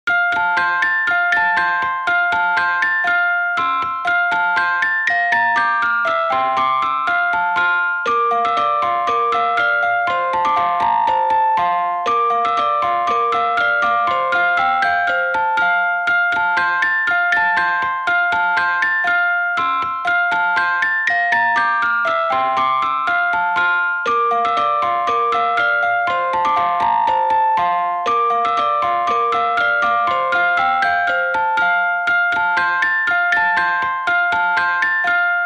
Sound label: Ice Cream Truck, Yankee Doodle